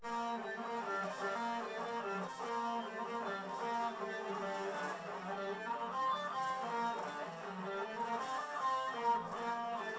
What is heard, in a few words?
nodrums; SiSEC10; underdetermined